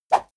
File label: whoosh small fast